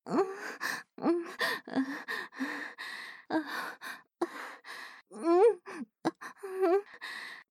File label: moan slow; oppaigames